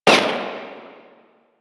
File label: Shield Fire; Warframe; Caliber Chicks minigame